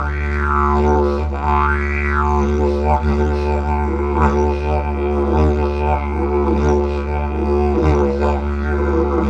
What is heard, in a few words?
Digiridoo